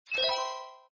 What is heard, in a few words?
earn Money